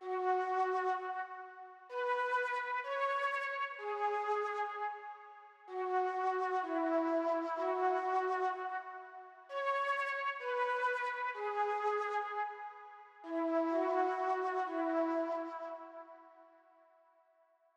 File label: flute g